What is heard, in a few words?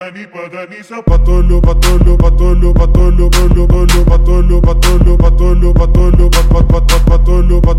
arabic music for cars trap